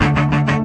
orchestra string hit D

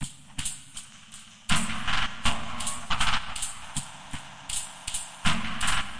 080 glitchy noises; 000 to 080 bpm

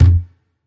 tabla hit; Hip Hop Specialty Kit; STYLE KITS (Hip Hop, Etc)

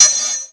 harsh ringer, synth

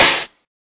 SNARE, Volca; drums; hip hop; WallsVado Volca Sample Pack

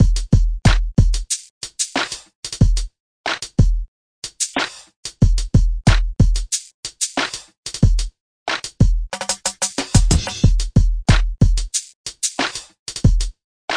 092 westside hiphop mellow, drum loops and breaks; 091 to 100 bpm

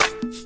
snare

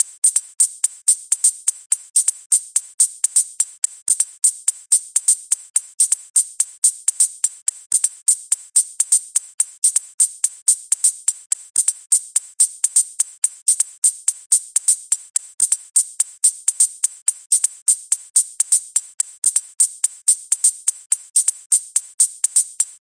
125 Hats; Optimism

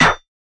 Snare; Khezie Hip Hop Kit

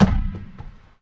yogaball floor, Big Rubber Yoga Ball

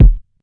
classic hip hop kick; STYLE KITS (Hip Hop, Etc); Classic Hip Hop Mega Kit

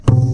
Upright Bass Notes; multisampled

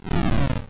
lofi sega sample; Lofi Sega Samples

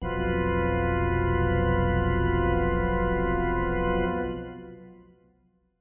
Heavy Pipes F, Heavy Pipe Organ; SYNTH KEYS

